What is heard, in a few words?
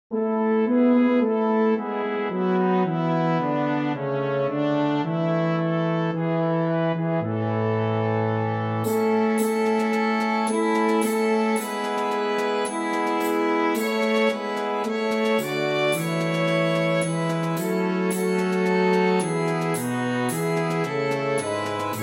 Violin track with Melody; O Come All Ye Faithful